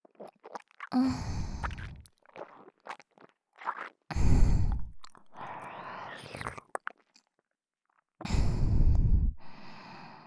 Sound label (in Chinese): 在耳边听吞咽的声音; 时雨姐姐也想要抱抱; NG音声; CV.逢坂成美